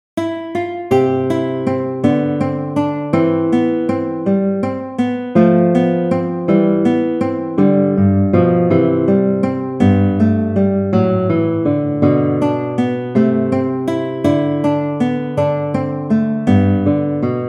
study major; giuliani mauro

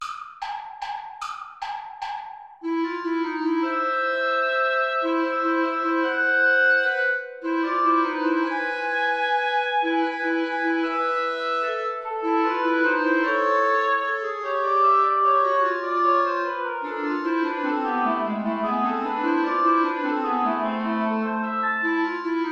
play along without guitar; traditional

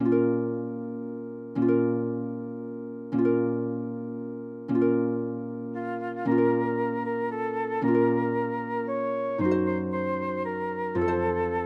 carol for flute harp; anonymous coventry

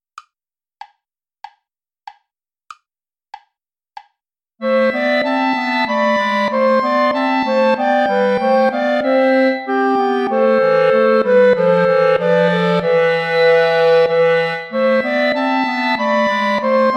play along without clarinet

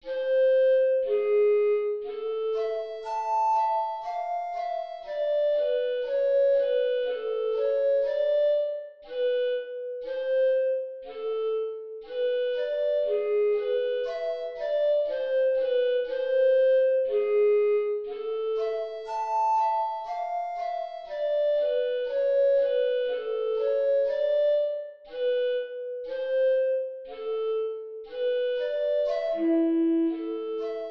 easy jazz

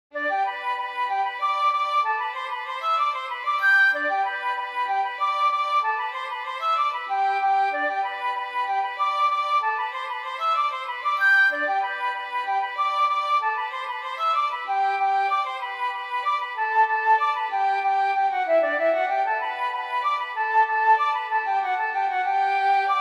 bourree; bourree chavagnac

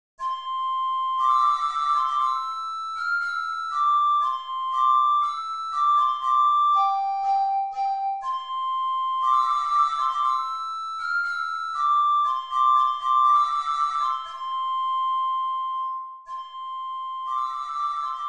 trumpet voluntary; Jeremiah Clarke

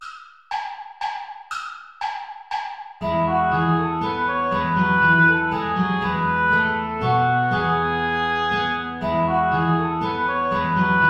saltarello play along without guitar; praetorius michael

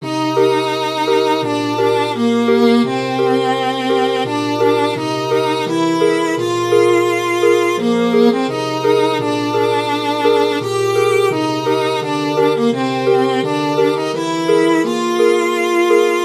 for viola harp

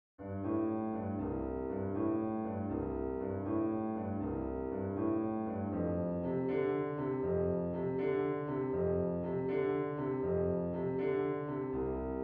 saloon piano

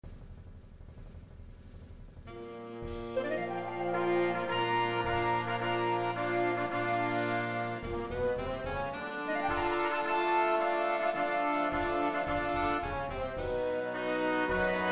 marching band intro

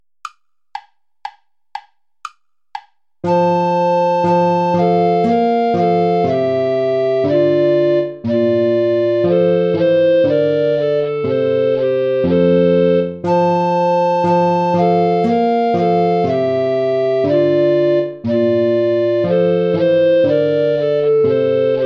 play along without soprano recorder; praetorius michael how rose blooming

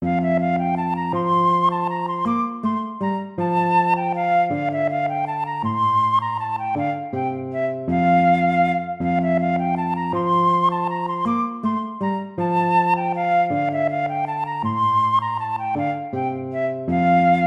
piece for lute

